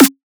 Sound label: drum snare smol; Virtual Riot; Drum Hits